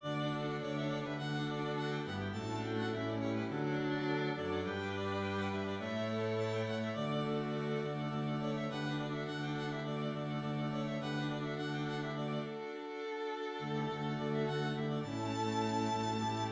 Hymn Strings

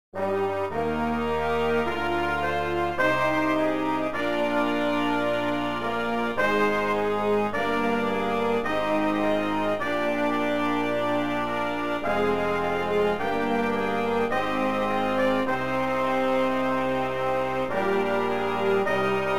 Hymn Brass

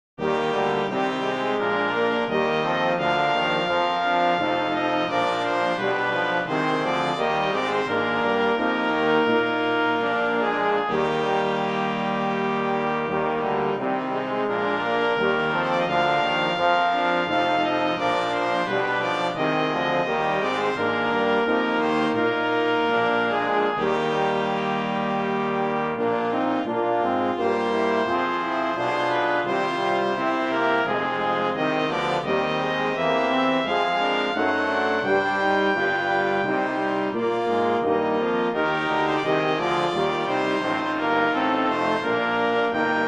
Hymn BRASS

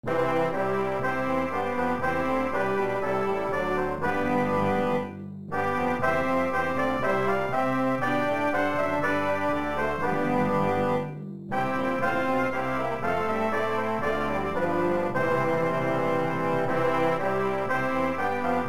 Hymn BRASS

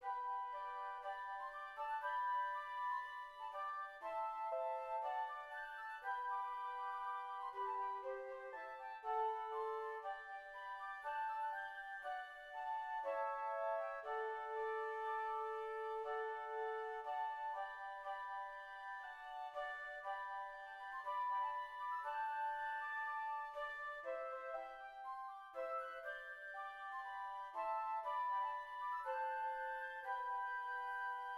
Flutes; And Can it Be (Sagina)

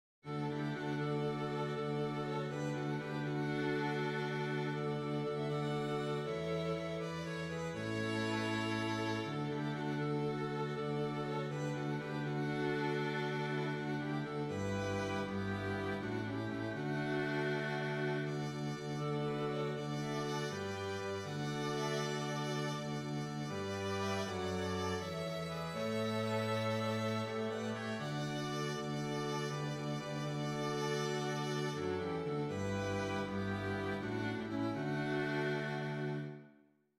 Strings; Blessed Assurance

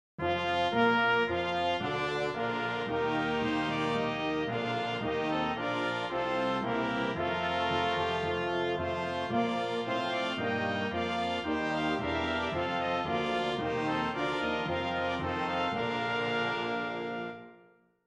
Brass; Rise Up Ye Saints of God (Festal Song)

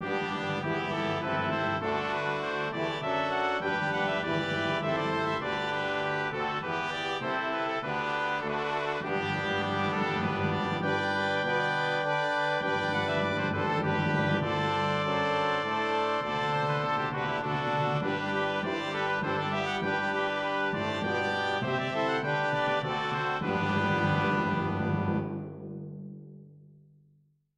Brass; God Bless Our Native Land (America)

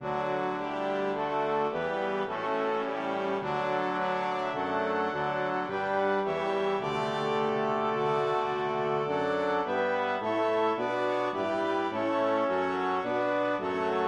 Hymn

